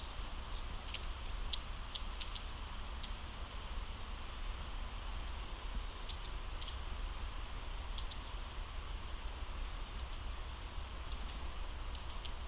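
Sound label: Long-tailed tit call; akasaka; enaga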